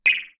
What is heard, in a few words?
Glass tap